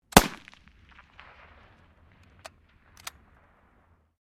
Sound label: RIFLE 303 MK1 FIRING; 1942; Rifles, military; Guns